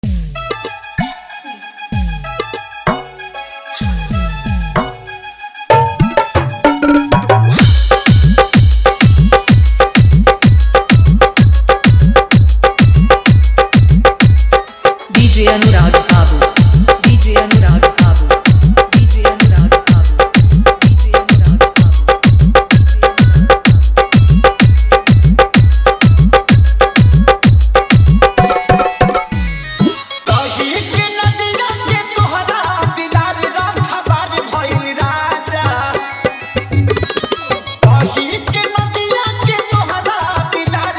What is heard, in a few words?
Hard To Hard Bass Bhojpuri Mix